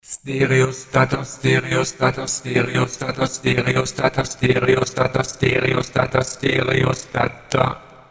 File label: stutter; tc helicon perform ve